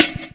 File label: Jazz Break